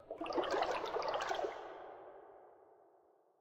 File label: water step; Minetest; backrooms